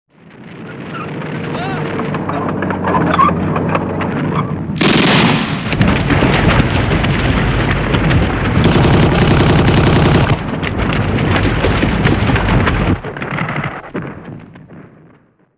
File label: battle